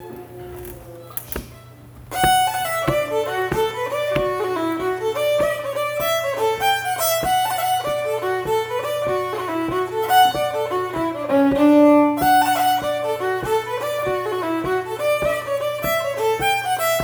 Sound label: jig